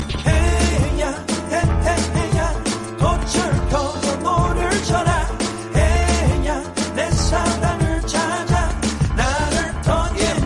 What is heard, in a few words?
English Song